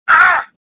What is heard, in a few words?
pain; swamp2; Star Wars Jedi Knight II Jedi Outcast; XBOX